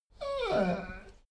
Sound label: dogo argentino whine short